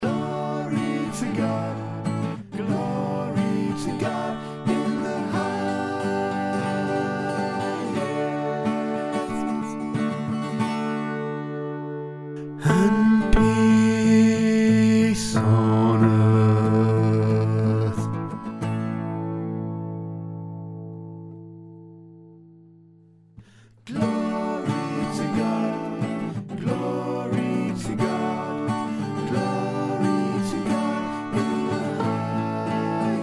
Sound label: bass; my messiah; Glory To God